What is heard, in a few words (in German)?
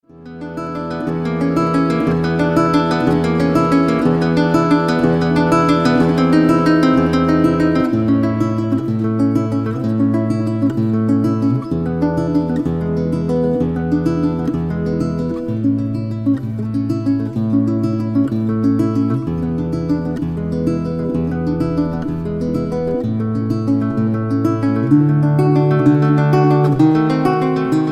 Praeludium A-Dur